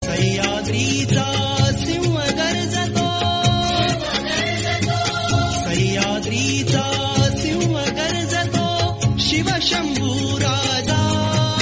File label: Ringtone